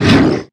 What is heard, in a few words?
Pickax Miner hit; KuberaMine